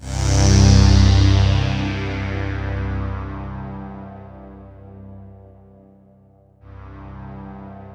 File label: POLY SAW, Roland JV 2080, C1